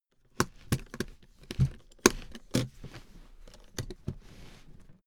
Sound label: suitcase handling rush